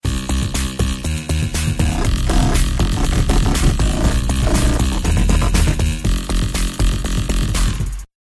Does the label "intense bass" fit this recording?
Yes